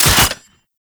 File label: Incarnon Wrist Gun Dry Fire, Weapons; Warframe; Tenno